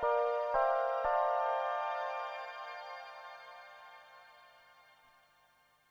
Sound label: Pad; Polyend12